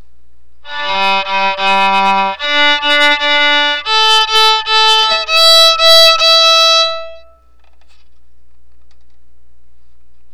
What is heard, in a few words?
piezo example